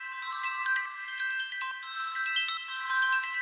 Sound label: Bells; Lily; Trap (Трэп)